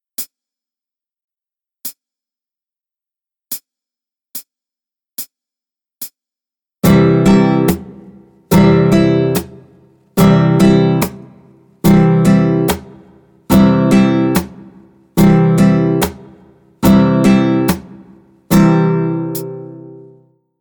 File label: practice example in slow tempo